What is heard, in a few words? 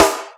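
snare, Boss DR-202; Drum Machines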